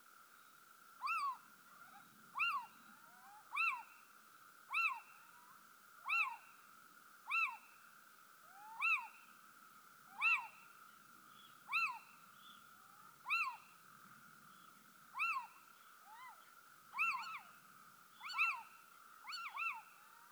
domovyy sych athene noctua; nochnyh ptits

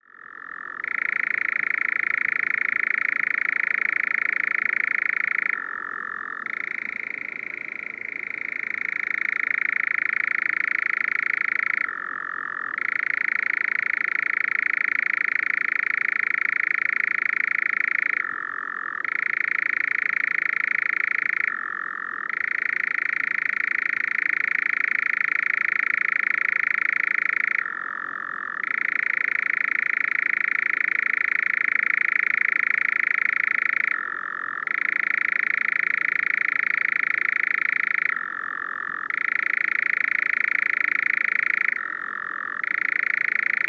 kozodoy caprimulgus europaeus, zvuki nochnyh ptits

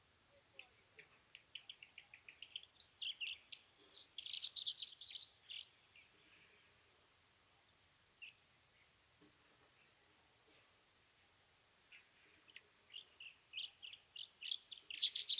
varakushka luscinia svecica, zvuki peniya ptits

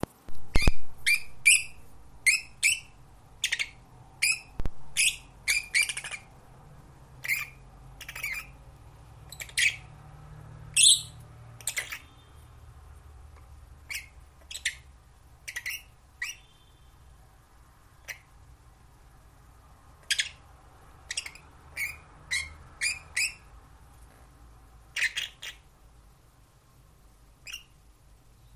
nerazluchnik poet, zvuki popugaev

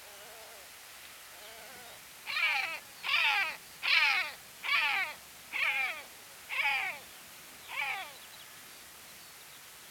morskaya chayka larus marinus

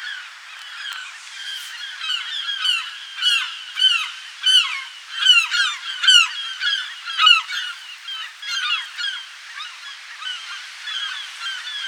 zapadnaya chayka larus occidentalis

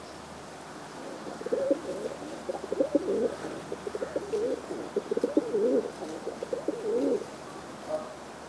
sizyy golub columba livia

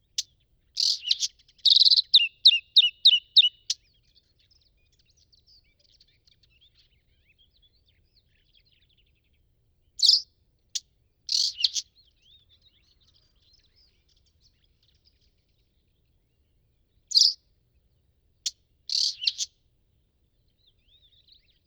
varakushka luscinia svecica azuricollis, zvuki peniya ptits

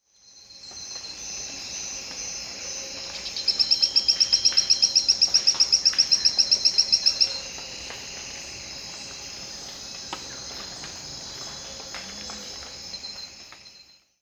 allobates insperatus, zvuki lyagushek i zhab

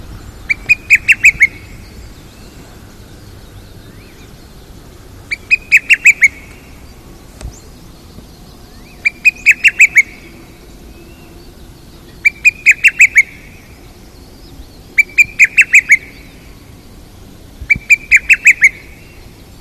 malaya kukushka cuculus poliocephalus, zvuki kukushki

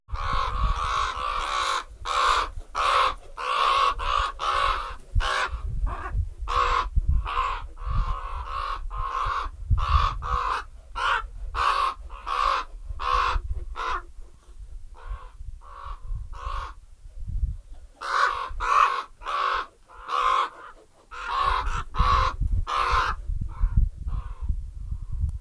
voron corvus corax

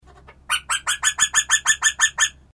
odnogolosaya kukushka cuculus optatus, zvuki kukushki

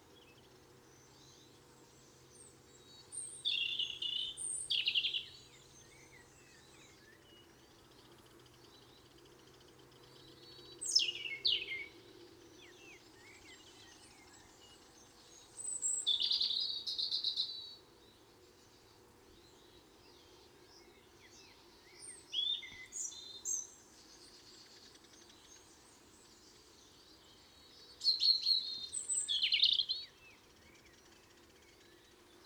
zaryanka erithacus rubecula, zvuki peniya ptits

